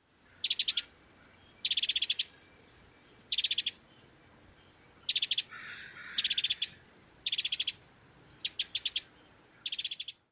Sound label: calls